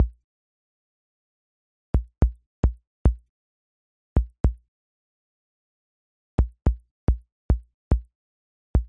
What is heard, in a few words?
kick, loop; Tone.js